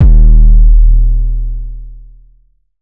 808 one shot Brassy Eb; Slate Digital BASSHOLE Sample Pack

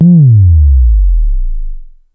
Synth oneshot Sub Sweep Down, Synth Bass; Slate Digital BASSHOLE Sample Pack

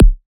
kick, Drum One Shots; SD MIDNIGHT Sample Pack; Slate

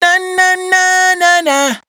riff Na Na Na 126 Db, Raw Vocals, Dry Vocals; Slate Digital Omnivox Vocal Sample Pack

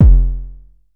808 one shot F; Slate Digital BASSHOLE Sample Pack

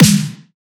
snare heart; Drum One Shots; SD MIDNIGHT Sample Pack; Slate

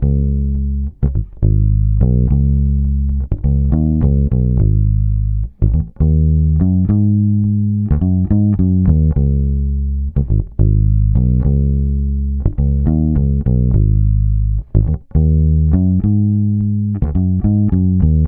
Bass loop 105 C#, Electric Bass; Electric Bass Loops; Sidewalk; Slate Digital BASSHOLE Sample Pack

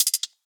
hat trill, drum sample; Drum Sample Pack; One Shots; Hats; Slate; SEISMIC

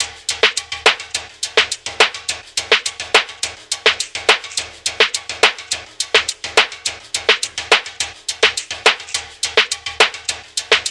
tops 105, Drum Sample Pack Loops Top Loops; SD SEISMIC; Slate

